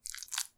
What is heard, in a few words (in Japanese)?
浅めにストローク, ピストン運動 激しめ; １ストローク単発